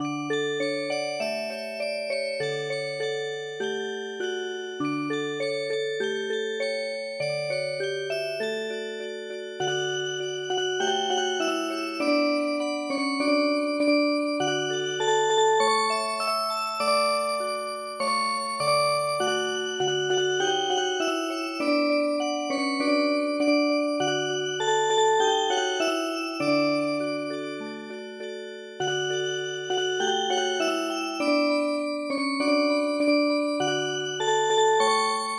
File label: Music Box, traditional; Tensagu No Hana